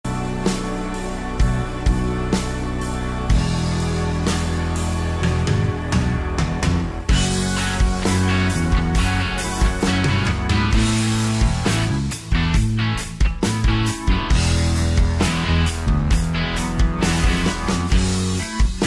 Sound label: solo backtrack A, rock; myvirtualband